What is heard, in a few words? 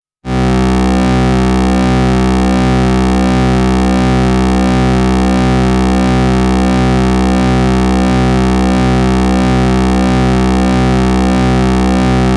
Moog DFAM Patch Sequencer Drone